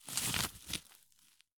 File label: soil stop; Escape from Tarkov